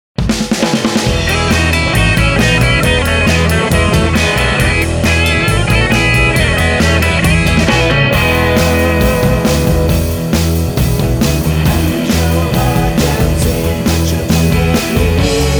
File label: karaoke